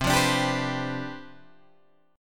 C Major 7th Suspended 2nd Suspended 4th, Guitar Open G, chord